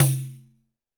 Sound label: TD-20 percussion; Percussions